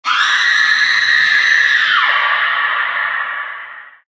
female scream, halloween; Source Engine; tf

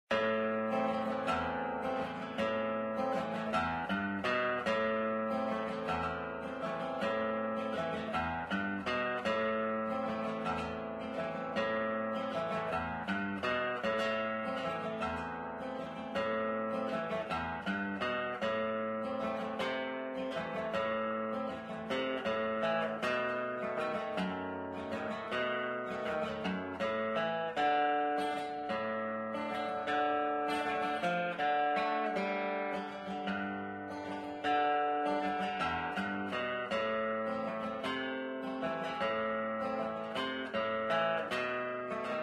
music; source engine; tf

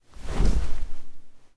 eli sit on couch; source engine; portal2; foley

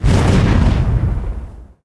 fire ignite, molotov; weapons; source engine; left4dead2

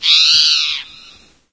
single bird; ambient; random animals; Left 4 Dead 2; Source Engine

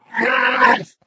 ballbot yikes

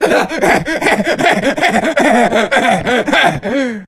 jockey lurk, voice; attack; source engine; left 4 dead 2

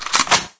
m4a1 silencer on, weapons; Source Engine; cstrike

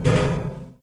ladder, player footsteps survivor walk; source engine; left 4 dead 2

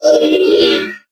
robot synth; Source Engine; Portal 2; robot parts

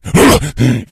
shoved, infected, rage; npc; Left 4 Dead 2; Source Engine

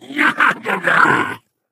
ballbot fist pump

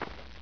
step, hgrunt; Source Engine; bshift

